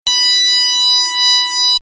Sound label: long beep; Quicksilver; Drag Race